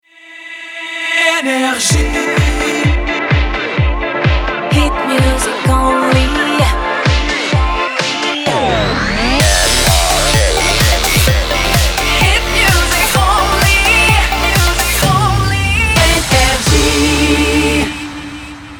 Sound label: jingle; NRJ